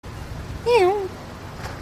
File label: Crab